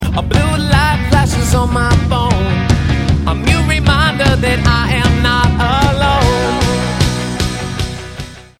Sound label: Ringtone